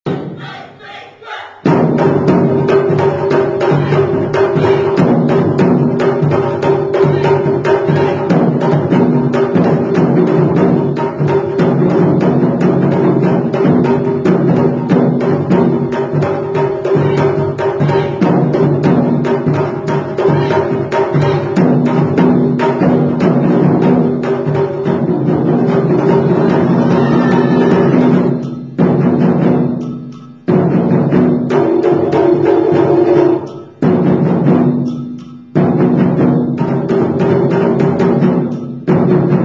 taiko de samba

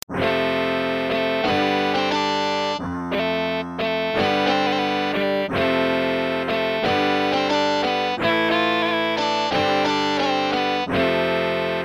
guitar solo

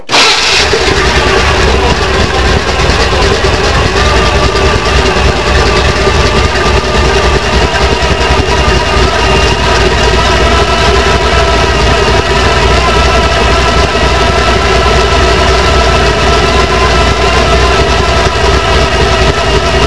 350 startup

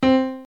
piano

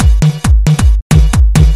bonko

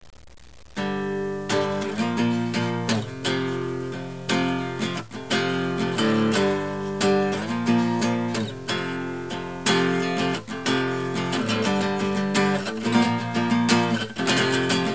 acoustic

